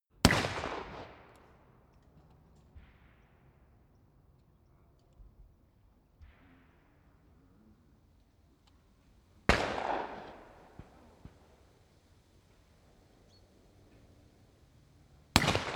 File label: gun shooting range